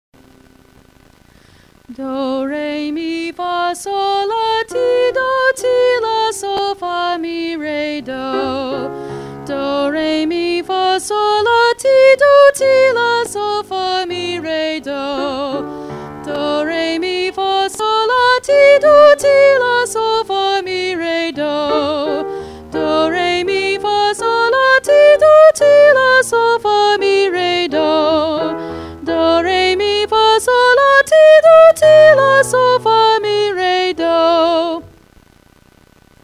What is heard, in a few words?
Warmup, Vocalization